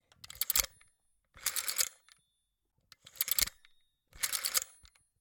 analogue rotary dial telephone dial release no cover; RedSonic